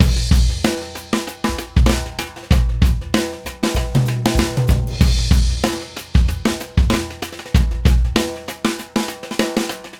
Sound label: 096 Pop Drums; Pop Rock; Moderate Tempo; Pro Tools Essential Loops; Digidesign